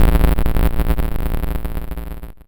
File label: error, Retro Sounds 32-Bit